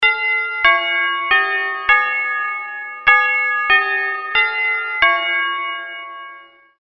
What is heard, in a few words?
Westminster Crystal; mistral 600